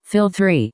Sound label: Fill